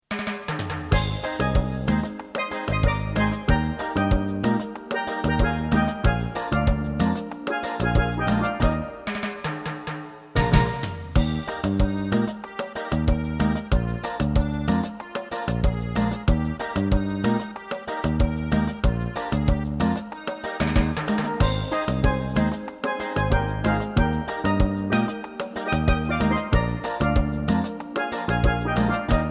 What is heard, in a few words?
karaoke